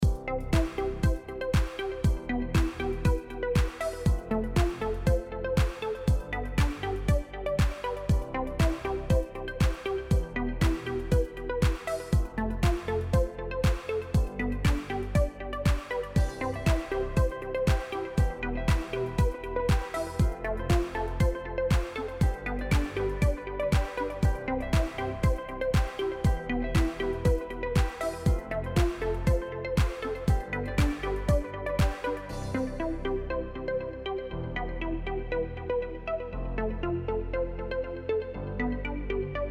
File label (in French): musique motivante; chaleur